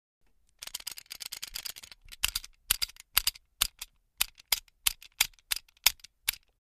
9 mm Tokeroav Pistol: Jam; Simulated Jam Of Tokeroav Automatic Pistol. Shaking Of Gun And Aggravated Slide Half-cocks To Simulate Jammed Weapon. Close Up Perspective. Guns.